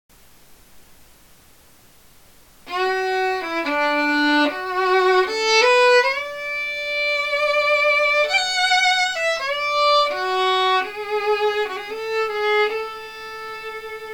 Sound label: violin solo